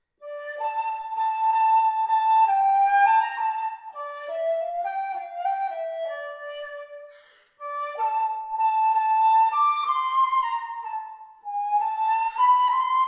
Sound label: Flute, one keyed boxwood flute; Kusder; Joseph Lafite; Au bord d'une fontaine; Airs varies pour une flute